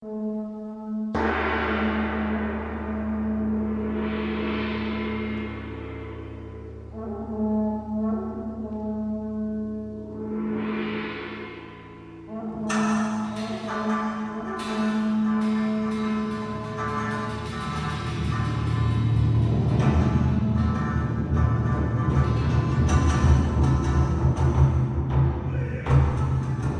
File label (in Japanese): 雅楽; 場内演出; 鯱の大祭典2025